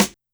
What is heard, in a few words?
Snare Crispy